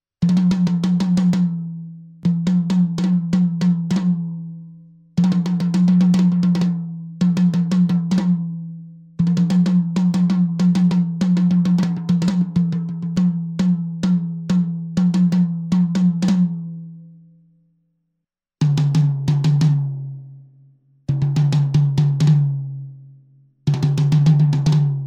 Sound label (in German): Toms 10 12 14 zusammen; Fame MS Drumkit Pro